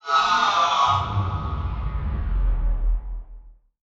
Title Transition Power Down; Production Element

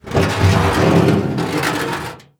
Impact Can Garbage Can Dragged On Cement Floor

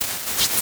Transition Distortion Electronic; Adobe; Production Element

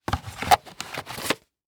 Technology Electronic VHS Tape Remove From Box

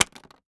Household Knife Butcher Small Hitting Solid Wood

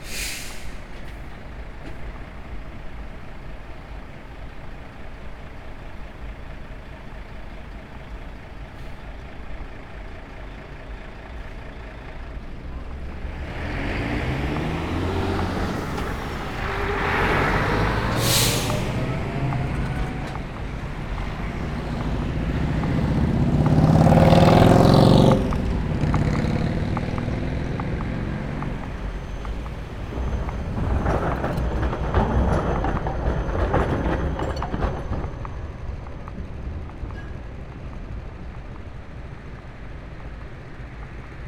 City Traffic; Detunized; GDC Game Audio Bundle

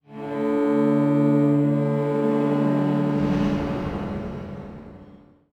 Transition Stab Dark Bell; Production Element; Title Transition; AdobeCC

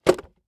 Transportation Seat Car Seat Set Down On Cement

